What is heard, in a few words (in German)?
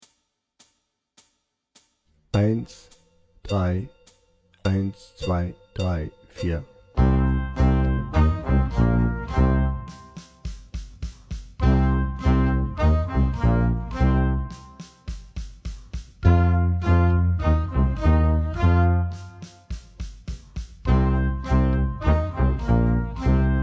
Hard Rock Blues Tuba lauter langsamer